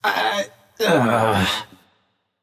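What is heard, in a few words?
nonverbal ugh; smite; voicepack; merlin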